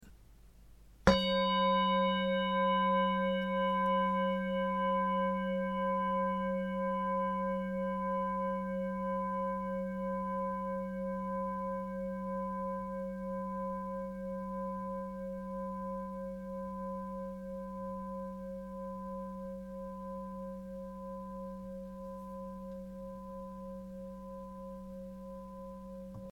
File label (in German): Klangschale